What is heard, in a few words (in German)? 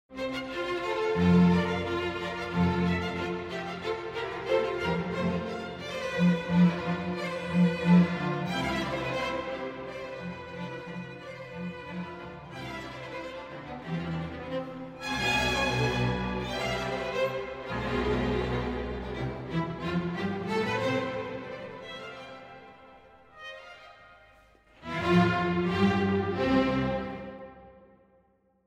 Streichersinfonie F Dur Allegro